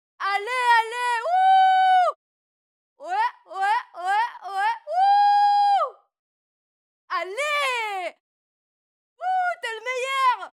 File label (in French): PUBLIC JEUNE FILLE ENCOURAGE CRIE